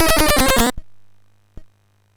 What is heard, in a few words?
Aurum, MOS6581R4